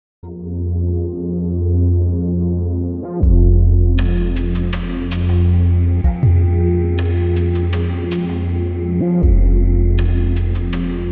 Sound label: techno; LES MUSIQUES DES FILMS